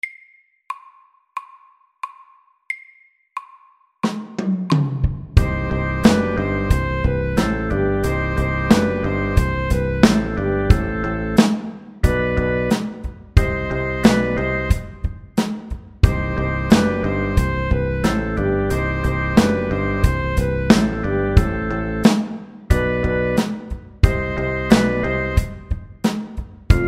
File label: Rocky poppy sax SLOW 90